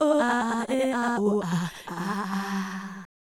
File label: Fem Vocal 128bpm G FX, African Voices